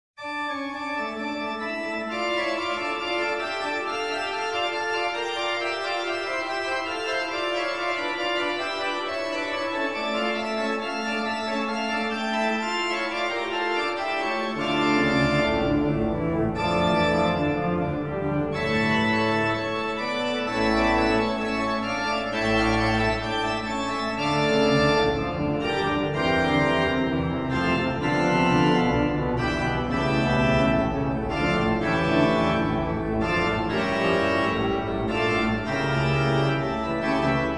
toccata, orgue; choral de bach

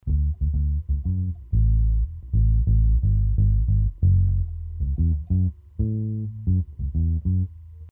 basse, reggae

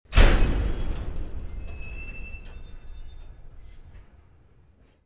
Train - accrochage wagon

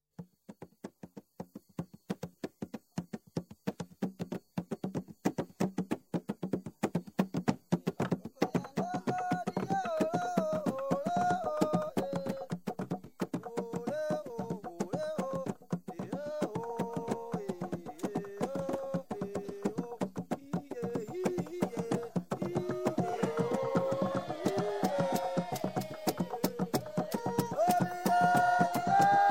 tambours voix aka; afrique

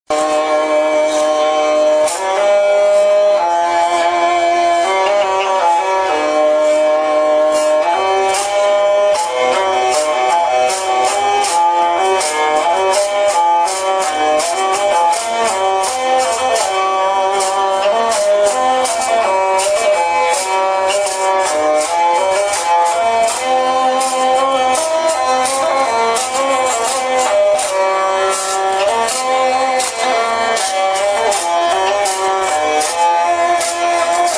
chifonie, moyen age

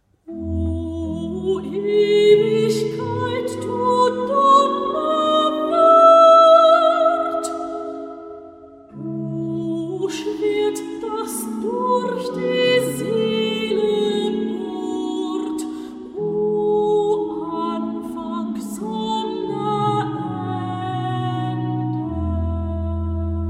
voix orgue; choral de bach

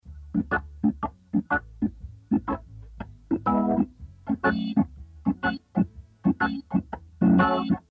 orgues

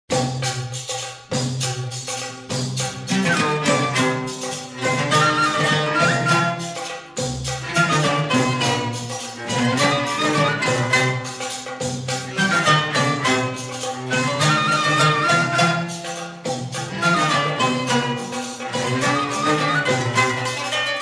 arabe instrumental; afrique; nordouest

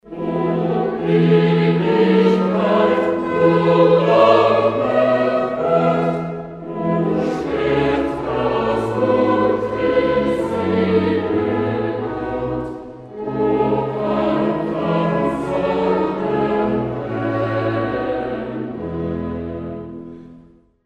choral court, choral de bach

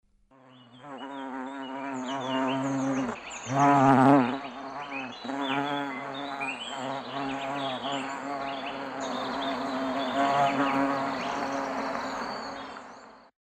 guepe; carnaval

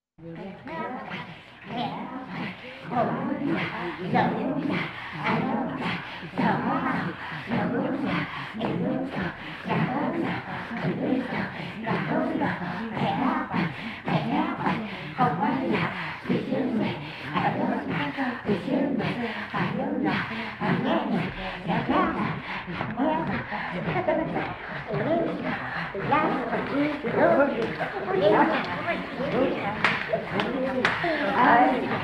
inuits, voix ethniques

